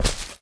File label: Grass step